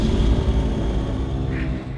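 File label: Music Volcano 120; from dust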